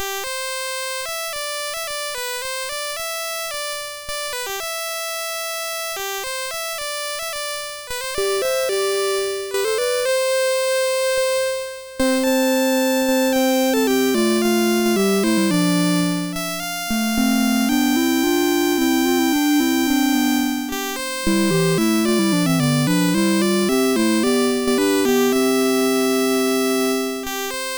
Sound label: Elven Hymn, MOS6581R4